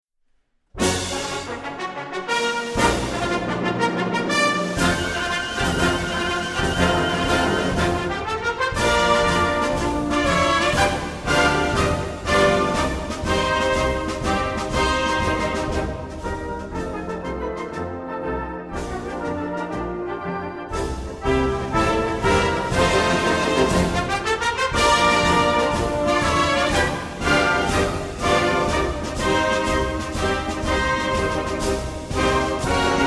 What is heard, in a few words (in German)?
Konzertmarsch in B-Dur